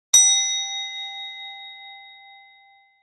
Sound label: Subscribe bell ding; shorts sound effects